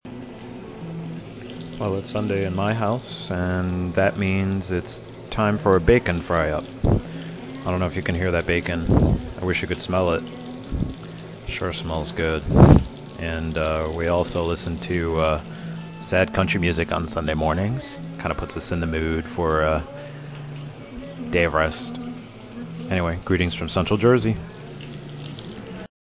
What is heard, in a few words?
sounds of my sunday morning